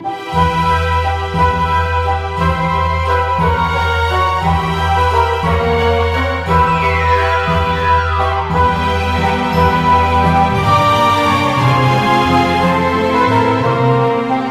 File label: orchestral; harry potter